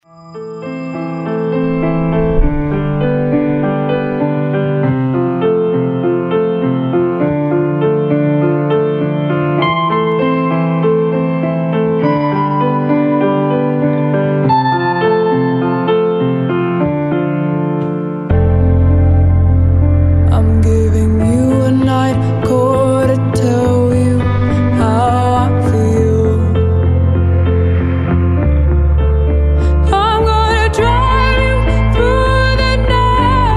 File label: TV ad song; Sony Xperia Z3 Gliding Lights